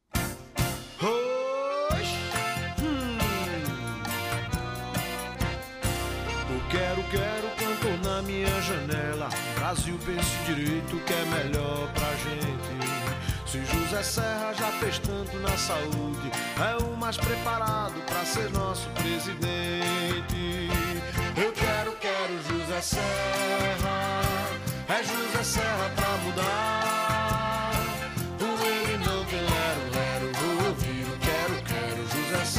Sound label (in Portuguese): Forro; 2002; jingles politicos